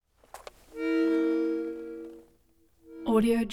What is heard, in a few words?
accordion; prosoundfx